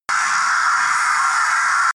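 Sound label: screech buzz reverb, science fiction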